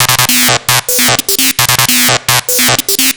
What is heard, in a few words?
Atonaloop, Industrial Analog